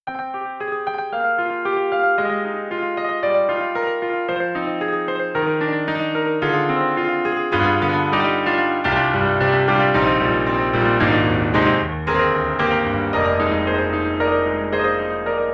KORG KRONOS 2 Piano

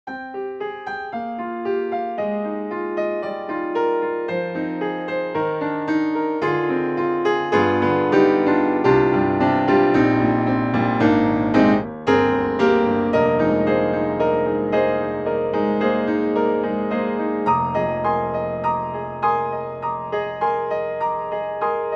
Ravenscroft 275, All Mic Full; VI Labs

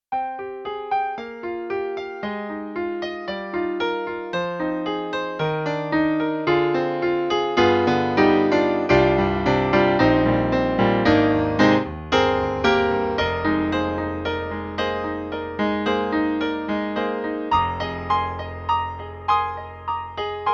ClassicGrand; E-MU; PROFORMANCE1+

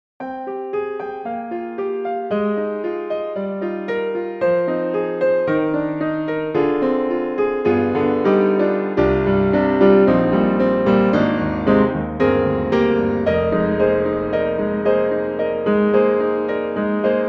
Grand Classical

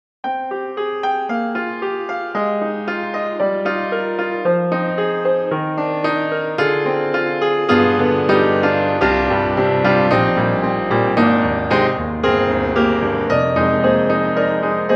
The Big Grand Chapel; VI ONE

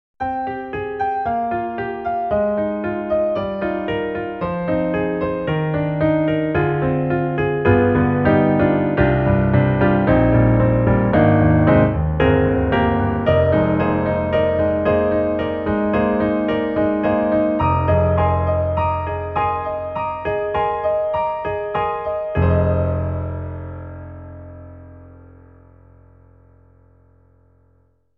EDIROL SD-90, Classical, Piano

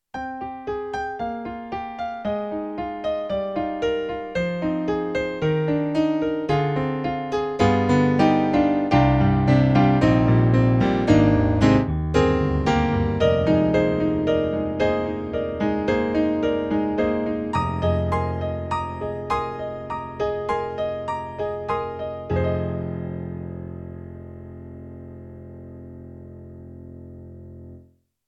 YAMAHA MOTIF-RACK ES Full Grand

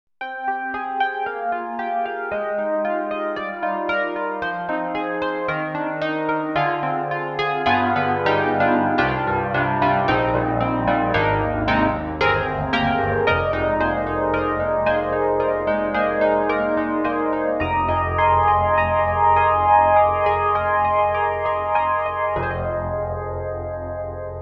EDIROL SD-90, Enhanced, Stage 73, Whirly

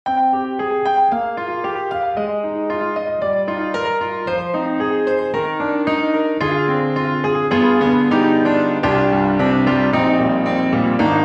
Grand Rhapsody Piano, Echo Grand; Waves

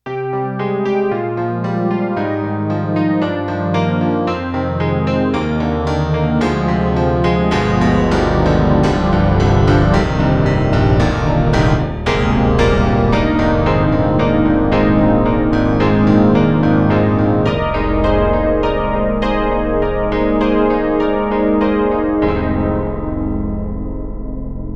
MIDI Grand; KORG NX5R

